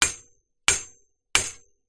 impacts metal x3 cage small

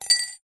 household cutlery item set down into glass; zapsplat

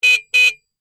motorcycle horn honk

